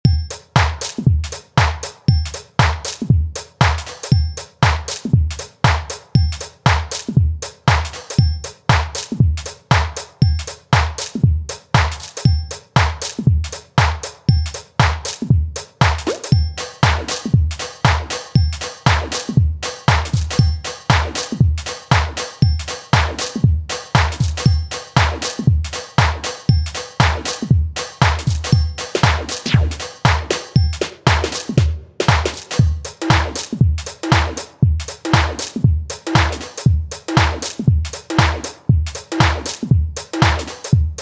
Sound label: drums